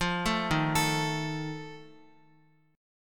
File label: Eb Suspended 2nd Flat 5th, Guitar; arpeggiated; chord; x,x,5,2,3,5; JGuitar